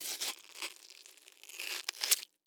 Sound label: Horror Scary Violence Scoop Eye Balls Out Of Socket